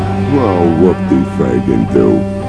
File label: oh whoop